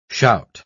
shout

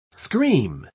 scream

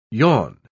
yawn

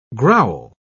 growl

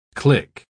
click

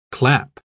clap

